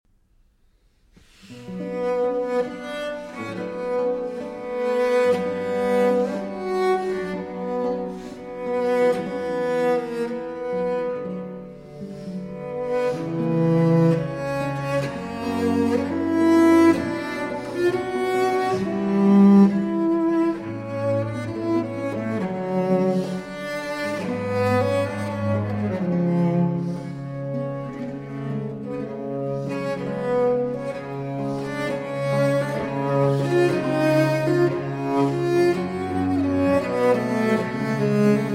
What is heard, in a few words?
Cello Concerto in E-Flat Major, Largo